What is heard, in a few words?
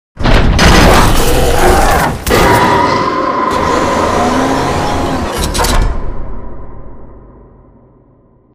Prowler Cage Fall; Titanfall 2; Boomtown